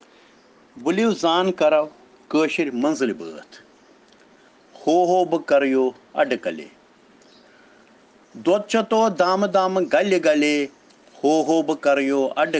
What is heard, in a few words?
Children Rhyms in Kashmiri